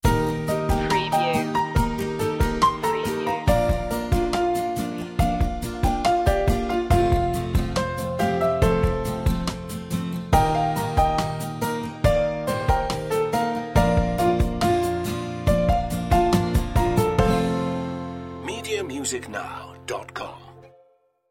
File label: acoustic